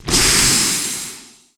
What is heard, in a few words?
Enemy Wood Poisonball Blast; gamerip; disney star smash; android